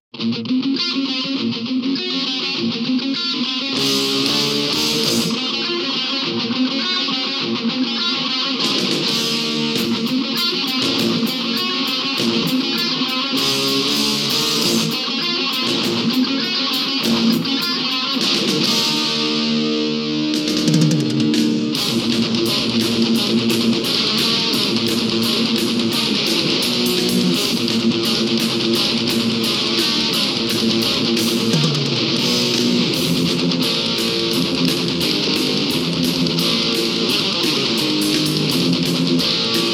Instrumental

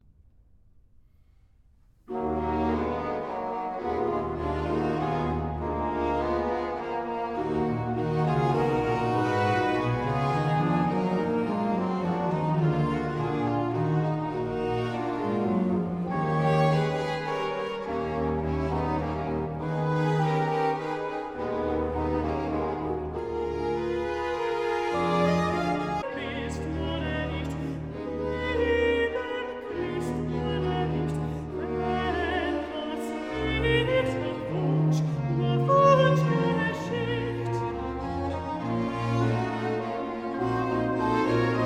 Aria Alto; Johann Sebastian Bach; Murre nicht lieber Christ

